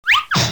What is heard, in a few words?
cart turn